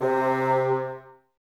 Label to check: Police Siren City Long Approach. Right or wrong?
Wrong